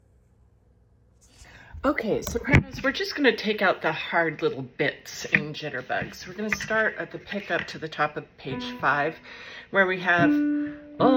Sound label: Soprano